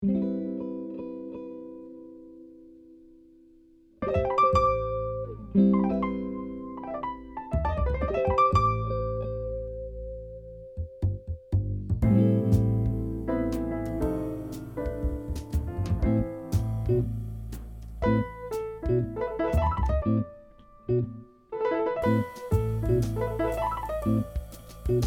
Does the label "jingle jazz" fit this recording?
Yes